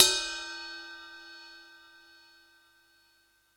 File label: Roland U110 Rock Drums A#5